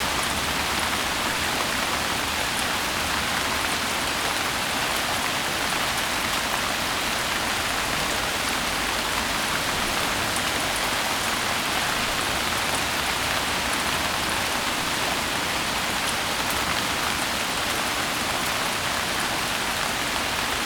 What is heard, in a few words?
RAIN HARD OUT; SIMUZENSOUND; WEATHER SIMUMAK